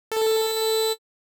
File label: FILTER Comb; Pigments